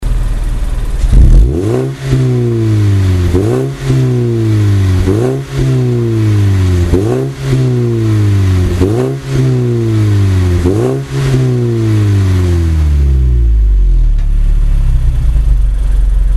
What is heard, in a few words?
Mazda3 Axela BM, Muffler; AutoExe